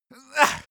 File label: male exert; menschen; koerpergeraeusche; soundpool